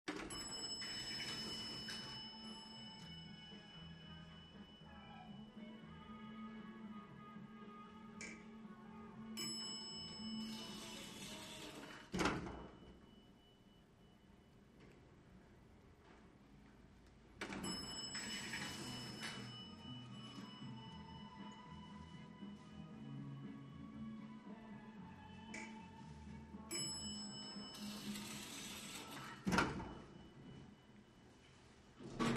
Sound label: Elevator Door Inte